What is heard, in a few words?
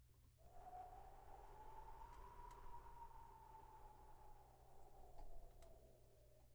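howling wind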